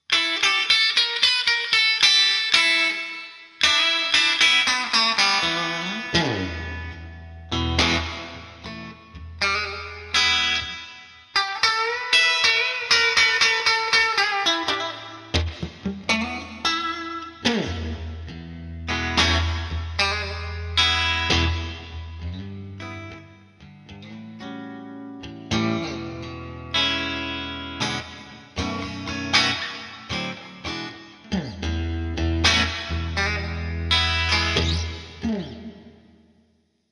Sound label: bridge, strat